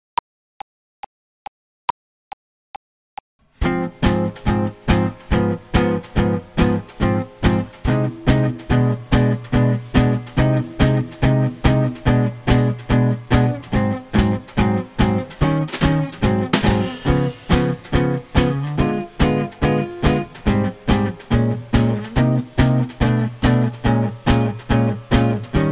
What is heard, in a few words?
drill in ab